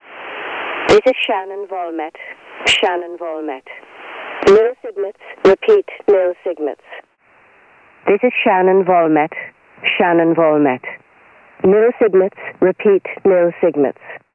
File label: 13264 Shannon; Belka